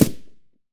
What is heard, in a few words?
m4a1 silencer mono far, weapons